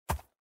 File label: soft